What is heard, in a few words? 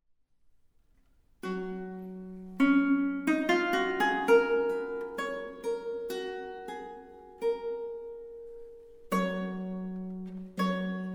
Renaissance Harp